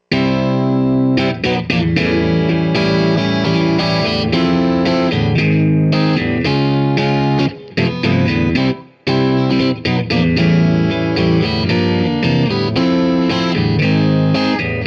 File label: guitar eq